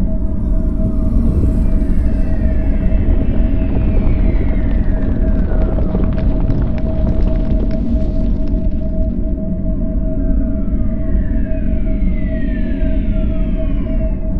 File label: amb battleship hum; necroid; Stellaris; necroids